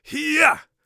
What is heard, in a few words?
heavy attack, Warrior Voice; Warrior Voice Pack